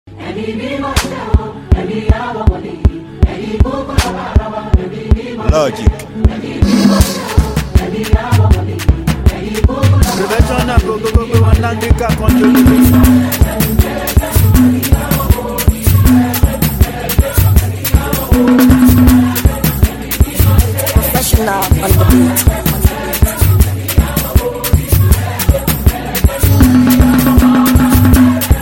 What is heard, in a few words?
beat